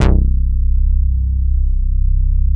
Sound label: Bass Long C; VEC1; Club; Long Basses